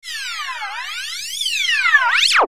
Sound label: Slow Warble; Warped; Sound Design